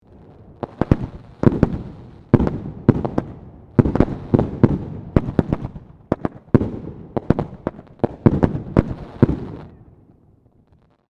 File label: Distant fireworks explosion low rumbles city at night